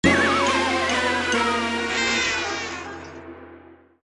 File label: Lose; SpongeBob SquarePants Bikini Bottom Bust Up